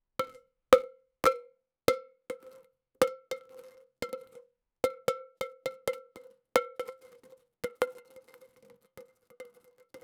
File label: sound of a straw hitting, drink